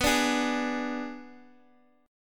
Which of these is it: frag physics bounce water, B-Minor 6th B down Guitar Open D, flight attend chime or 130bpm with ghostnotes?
B-Minor 6th B down Guitar Open D